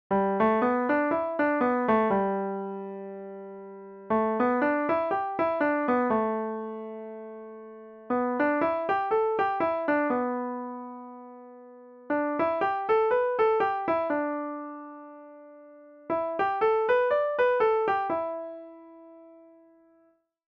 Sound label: 5 positions, banjo